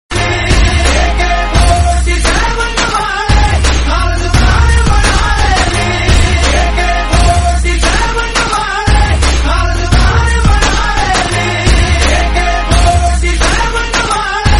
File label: song